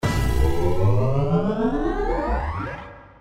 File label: BonusAnticipation, SLOTS; Crazy Rockets